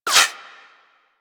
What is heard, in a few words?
Laser Whizzby; Warframe; Weapons